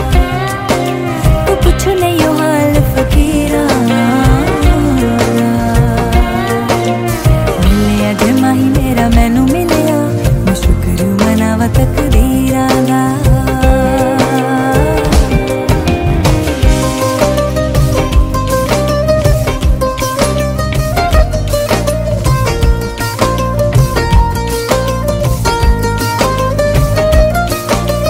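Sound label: bollywood remix music ringtone; bollywood ringtones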